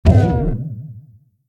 cog jury

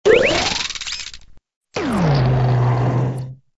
evil eye; Toontown Online

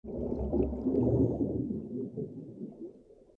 Underwater; Toontown Online

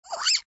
AV mouse question

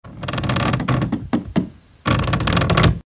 dock creak

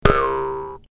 clock; toontown-ceiling

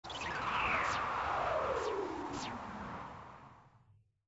filibuster

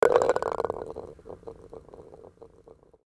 Golf Ball Rest In Cup

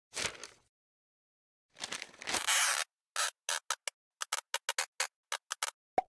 restraining order; toontown-ceiling

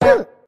toon hit; toontown-ceiling

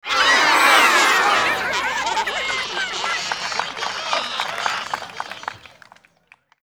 Golf Crowd Miss; Toontown Online

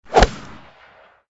tee off

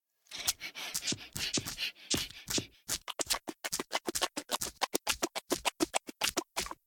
pet the pet; Poodletooth iLand

